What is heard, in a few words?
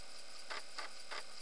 Search Skip Search; activegs ios